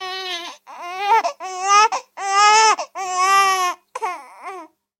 uteshenie rabotaet slezyi zatihayut, zvuki placha rebenka

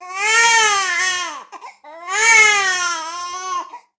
realnyie slezyi naturalnaya zapis, zvuki placha rebenka

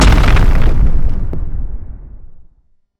meteorit padaet udar o zemlyu

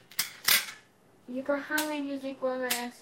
zvuki kinohlopushki

